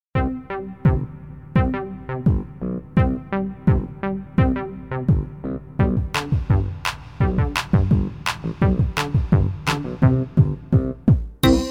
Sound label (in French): VERSION KARAOKE; Alex et Zoé 3